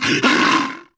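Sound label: Bear Attack Voice; Civilization4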